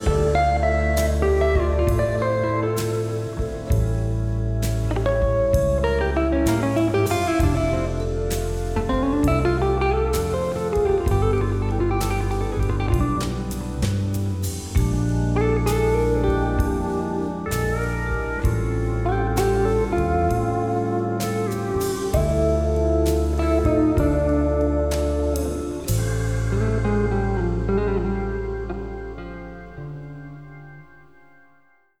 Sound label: Gibson super 400 ces; LES PAUL SUPER 400 CES 1953